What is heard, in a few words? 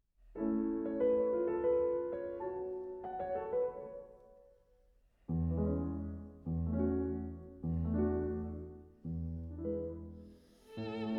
Bridge Serenade, Violin and Piano